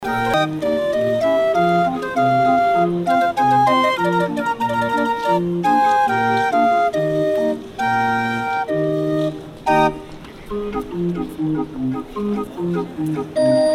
barrel organ

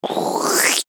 human slurp

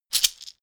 shaker wood hit double hard

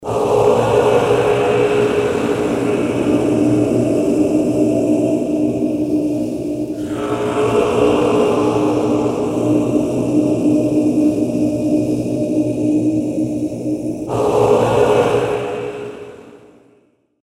tibetean munks

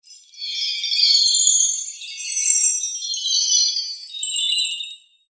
mystic chimes

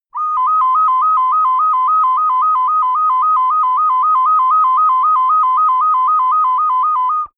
ocarina trill

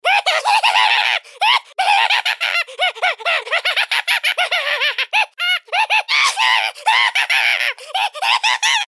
mad laugh

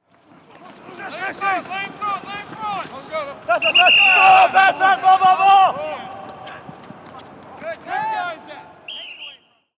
football training

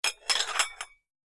stacking plates

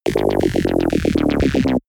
alien music

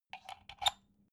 socket plug soft

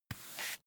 broom hand sweep dustpan

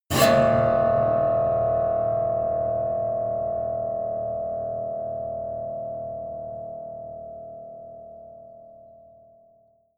piano twang fast squeal

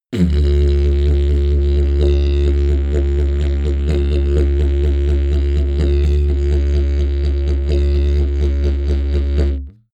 sequence didgeridoo wobble sequence

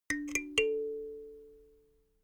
notification kalimba triad up major

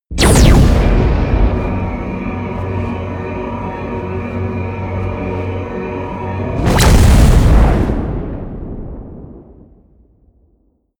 promo pad

